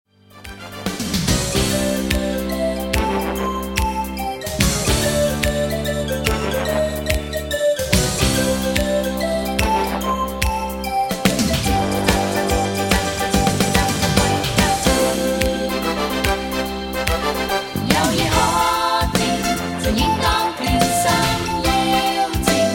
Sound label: Karaoke